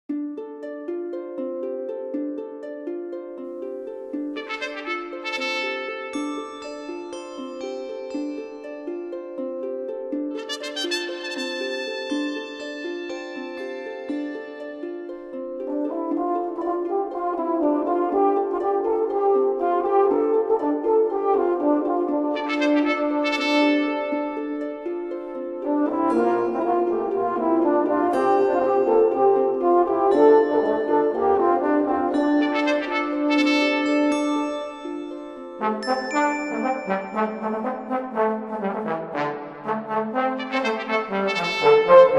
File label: Morning Trumpet, Hymns For Organ, Brass